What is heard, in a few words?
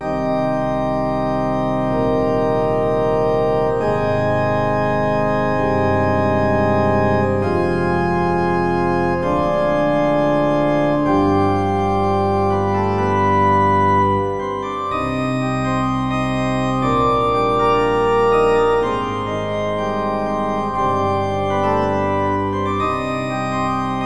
Church; Red Comrades Trilogy Reloaded